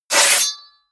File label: blade draw large